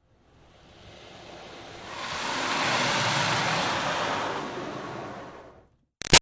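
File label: Water Spray Interior Car By Soft Slow; Car Wash; Spraying; Big Room Sound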